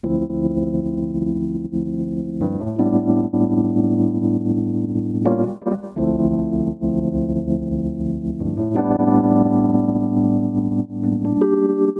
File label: rhodes on; lofi drifter; yum audio